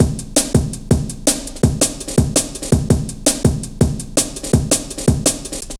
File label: Ruffneck, Drum Loops 165.5; Jungle